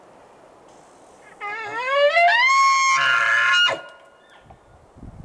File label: elk herd bull 5 yards